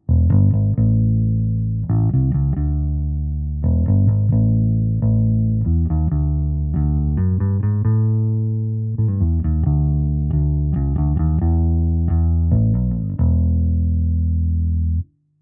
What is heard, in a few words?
Rupert Neve Designs 517 Bass Amp EV PL20 Comp 11 blue light often